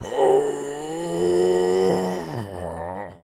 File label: Cultist Player Caught; Devour 2021 gamerip pc macos